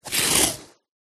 foley string length run along stretched line string rub